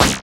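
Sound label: PER PROCU, Procussion, percussion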